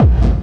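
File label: hard bass drums; Bazzdrumz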